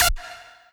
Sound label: lead